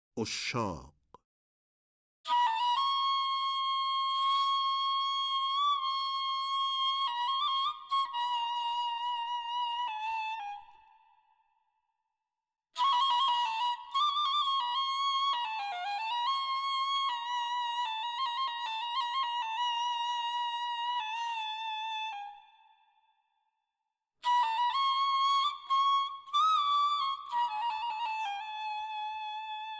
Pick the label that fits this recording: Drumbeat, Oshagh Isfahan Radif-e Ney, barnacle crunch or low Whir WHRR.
Oshagh Isfahan Radif-e Ney